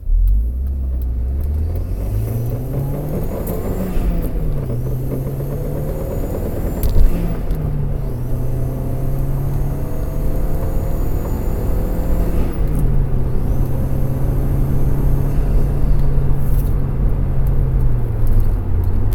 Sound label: noise acceleration, tt acceleration noise; hard describe noise acceleration